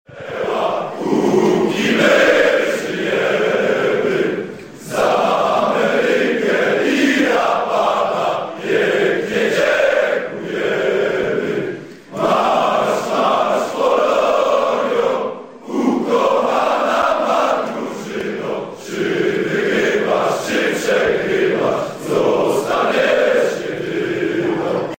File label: hymn